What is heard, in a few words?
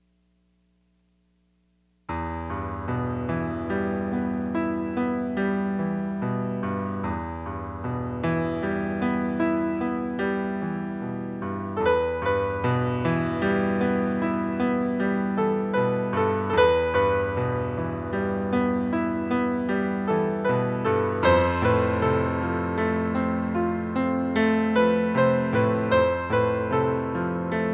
Piano Ocean